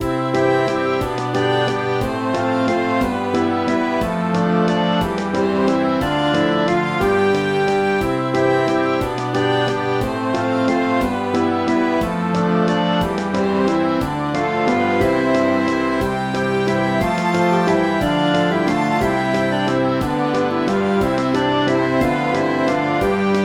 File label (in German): waltz, Streicher